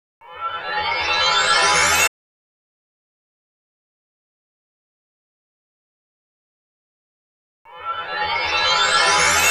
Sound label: 129 Trance Bm Sfx, Electro Synths; Prime Loops; Complex Electro Synths; TranceOut